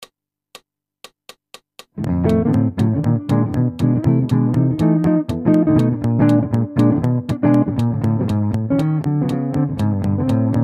F Blues, Guitar

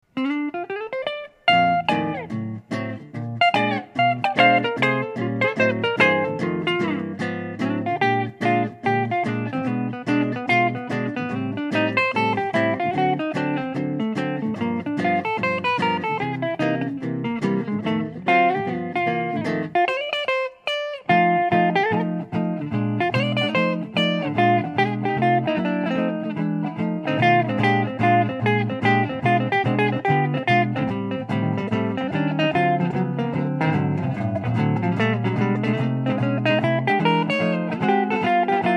2way Guitar Amplifier

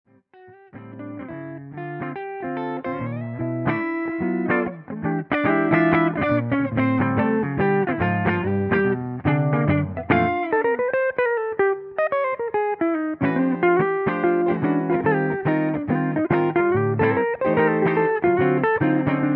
ES335 vs AS200 Line, Guitar

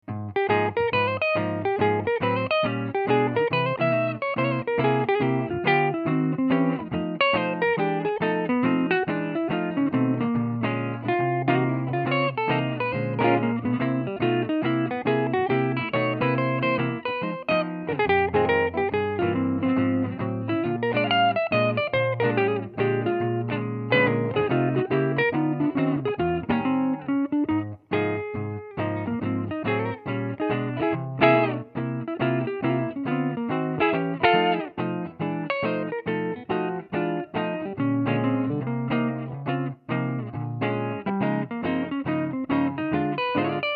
Tortoiseshell Pick, Guitar

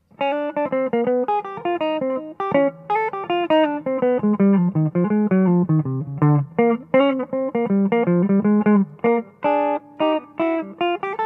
Solo, Stereo Guitar Amplifier

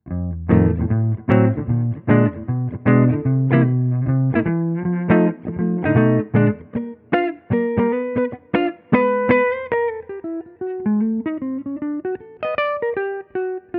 Monster, Guitar; Ikakoo Gathering

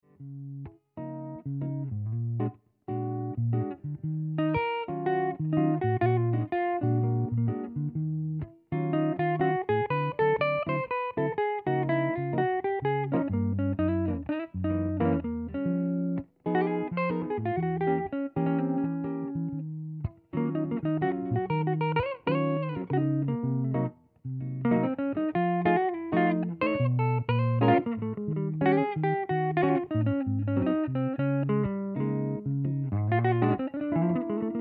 Mute, Guitar

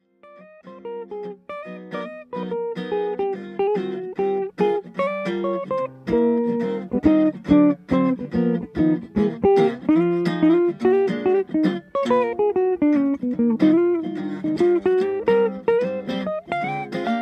Acoustic Guitar Public Address System

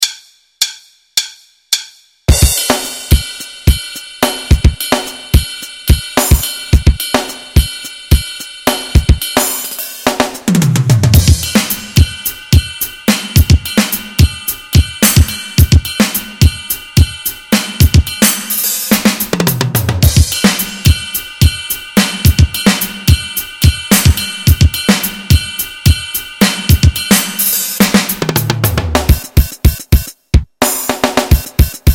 Drum Comp

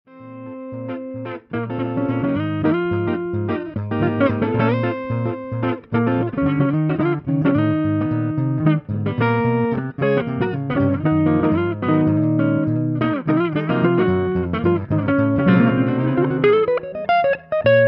Tube Booster, Guitar

